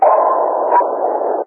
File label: selection, Sounds Submarines; PlanetFleet